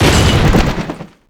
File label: damage; blackquill